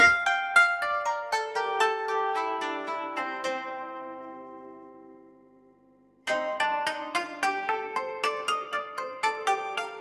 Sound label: musicgen japanese traditional